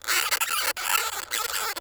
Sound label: Forest Rodent Squak; Warframe; Grineer Forest